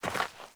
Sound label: Fawn Step; Encounters; Animal Rescue